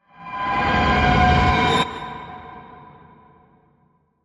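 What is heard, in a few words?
reverse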